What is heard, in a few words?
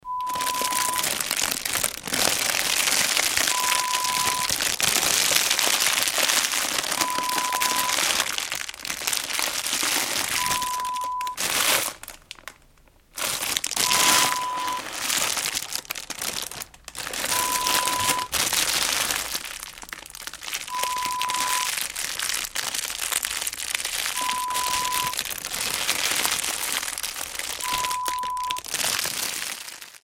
Rustling, Crinkling sound